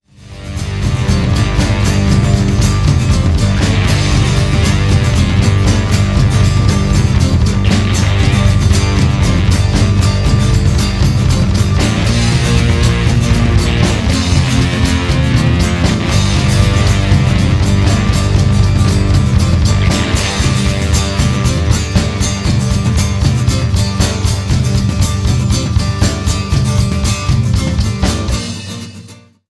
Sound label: Wrecked Chords